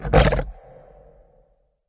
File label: run breath; smite; Anhur